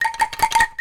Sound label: A#4 anklung, Java anklung; WorldSounds